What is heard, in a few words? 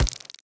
step layer, headcrab black movement